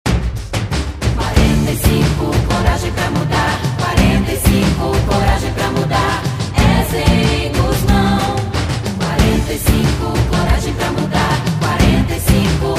jingle campanha; herzem gusmao

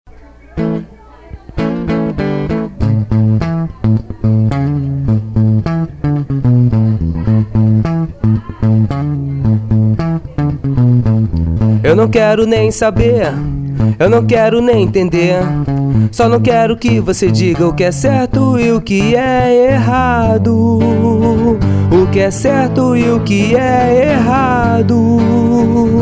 ensaio acustico

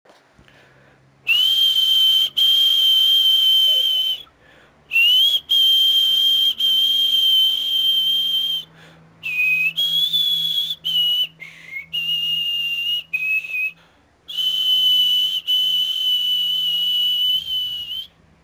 vinheta; sabia; champions

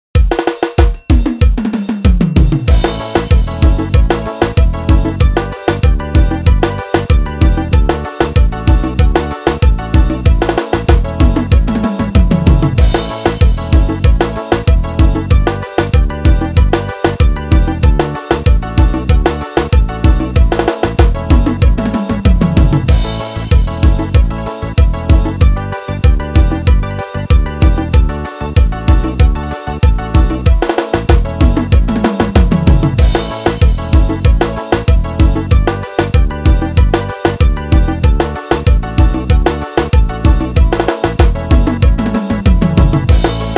teclados; yamaha

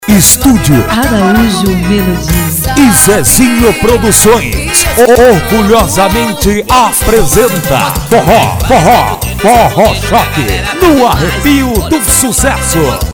forró

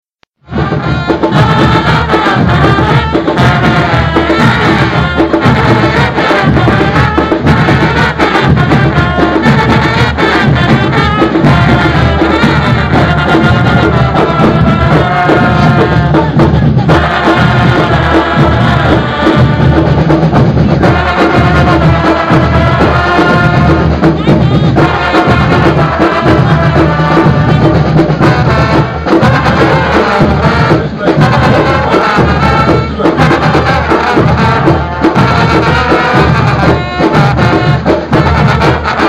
fanfarra desfile; em capela

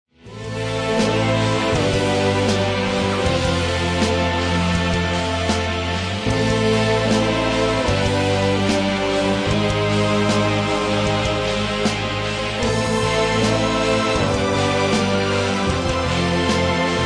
solo da musica

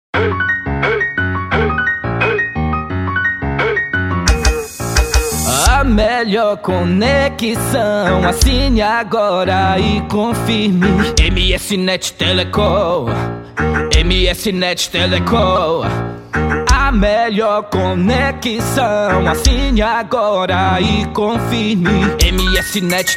jingles politicos; jingles para internet; musica para provedor de internet

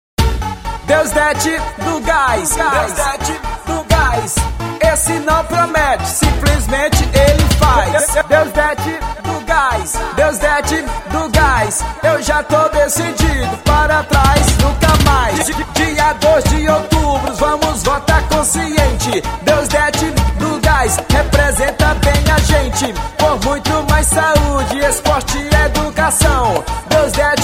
jingle funk; deusdete do gas

